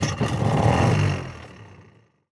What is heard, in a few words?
vehicle smallboat ignition; teardown; ps5 windows xbox series xs